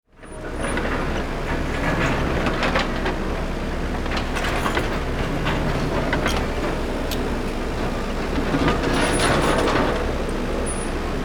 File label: Excavator construction vehicle bucket move